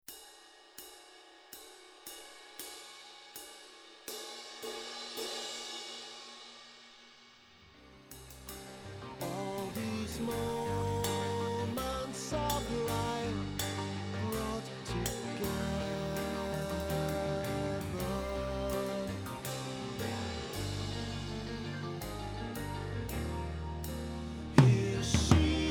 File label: ride cymbal